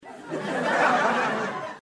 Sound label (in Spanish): risas serie